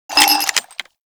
Post Shot; Warframe; Scaldra AK

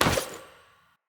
Long Sword Catch; Weapons; Player; Pothia Long Sword; Swords

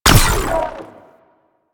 Space Fighter Laser Fire; Space Fighter Laser Agent

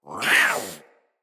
Feral Vocal Attack Short; Catbrow

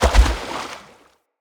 Gallop Water, Animals, Foley, Steps; Bromius

